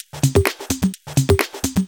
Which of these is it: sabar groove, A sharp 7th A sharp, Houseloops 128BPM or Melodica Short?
Houseloops 128BPM